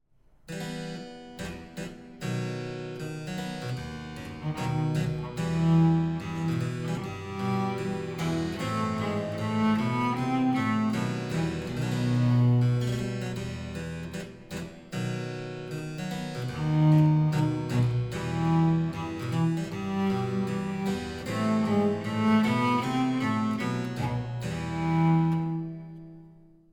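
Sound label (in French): refrain violoncelle basse continue; baroque; forets paisibles; Les Indes galantes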